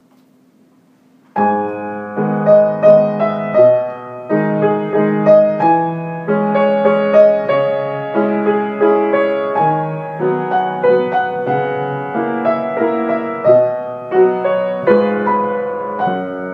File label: waltz; Brahms, op 39 no 3, Waltz in g#